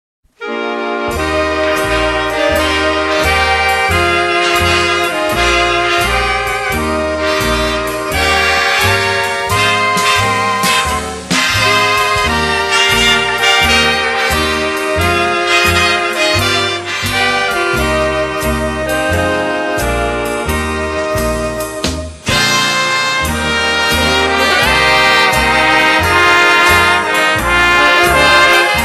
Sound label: jazz new year